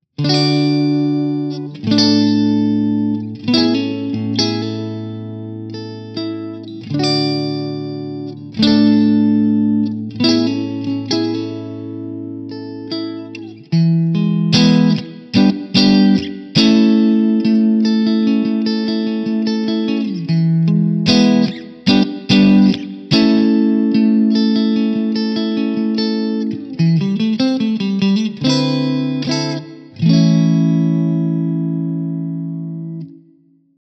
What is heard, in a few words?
clean; jn12-100t-spec